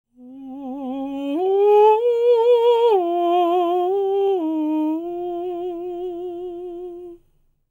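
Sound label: falsetto, Vocal Planet Jazz FX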